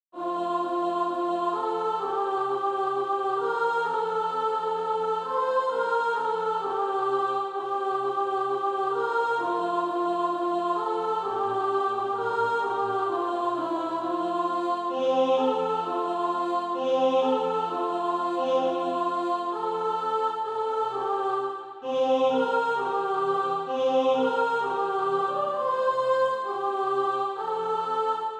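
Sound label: soprano, Practise Music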